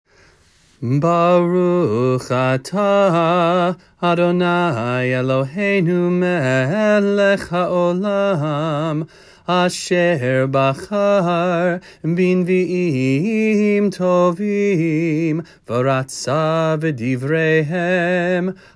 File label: Blessing Before Haftarah; youth; bnai mitzvah; prayers